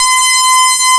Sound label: synthesizer C5